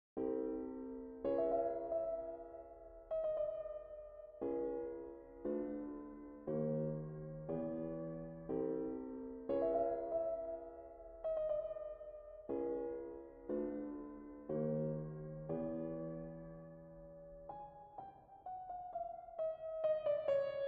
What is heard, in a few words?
Quarter-tone Piano; Quarter-tone Piano Prelude No. 3